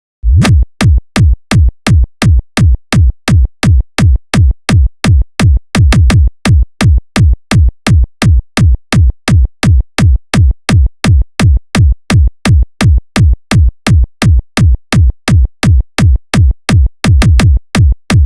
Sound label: Kick